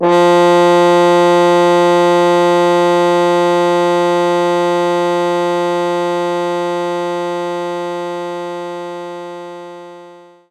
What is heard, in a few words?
French Horn F2